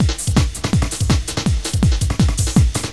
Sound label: LOOP, Eurotech; Sounds Good